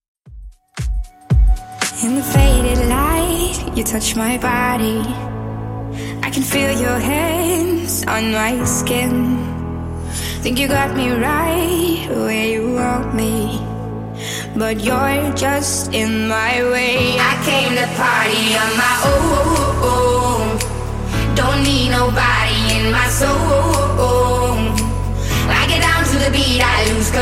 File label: Dance 2ks Redrum 115bpm